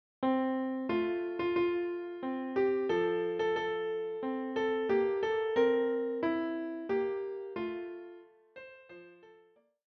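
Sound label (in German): O Tannenbaum Klavier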